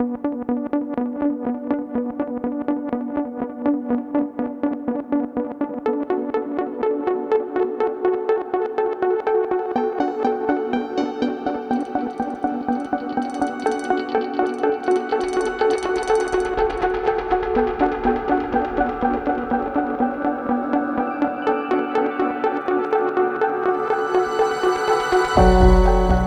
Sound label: Minimal Euro